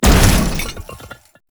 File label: Loot Crate Large; Tau; Tau Circles Of Hell; Loot Crates